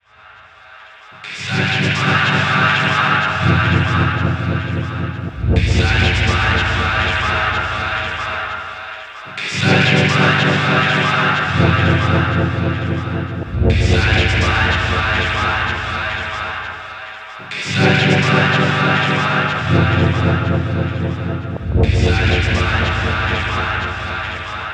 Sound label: Ambient Space Tek